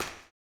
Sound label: STOMPS; Spectrasonics; Vocal Planet; Blues Soul